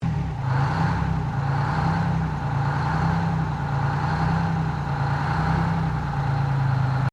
Truck Kenworth 12V71 Rev, Old Kenworth truck; 1969; Truck Sound Effects; Vehicles

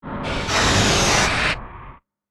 Robot Breath, Scifi; Robots (Sound Effects:Science Fiction); BlastWave

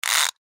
Clicks Dial Device Object Short; Blastwave; Foley

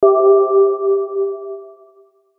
Hit Vibraphone Harmony Reverb; Blastwave

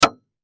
Hammer Nail Hit Wood; Hammers; Tools; Industry; Blastwave

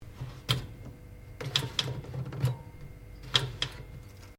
OVEN FANFORCE CONTROLS OPERATE; Common oven sounds; Appliances; Household

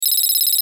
Satellite Zoom In; Buttons (Sound Effects:Multimedia)

